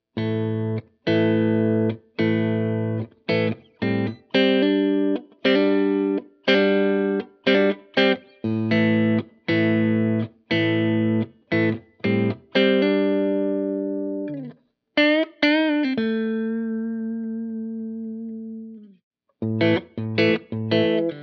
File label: middle clean, pickups